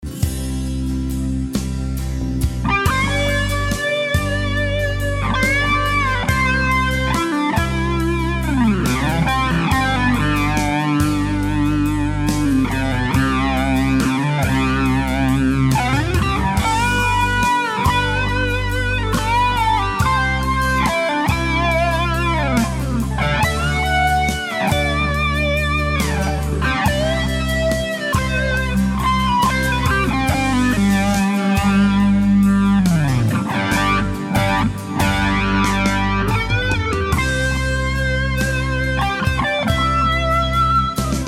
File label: Ram Muff EM OLD TD; BK Butler-Chandler Tube Driver; EH Deluxe Electric Mistress; Nova Delay; Solo with backing track